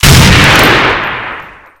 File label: Sniper Rifle Fire; Requests Studio; UT3